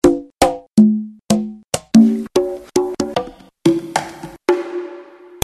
MISC CONG

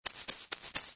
SHAKER

